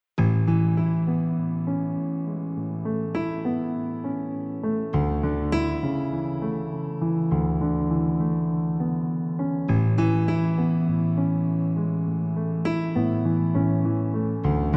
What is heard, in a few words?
instrumental